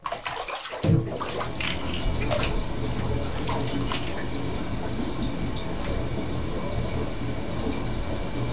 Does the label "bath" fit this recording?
Yes